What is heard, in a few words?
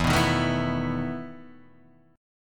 E Major 7th Suspended 4th; chord shape